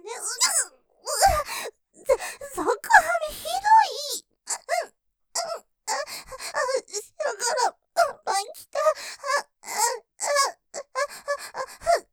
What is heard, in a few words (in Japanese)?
喘ぎ, 喘ぎ; ちんこ挿入！感じちゃう！即ハメ立ちバック！; ループで楽しむ！シェフの気まぐれ抜き抜きコース